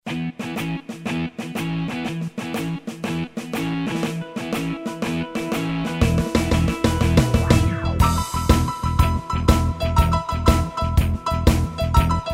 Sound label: Rock Shuffle; Pop & Rock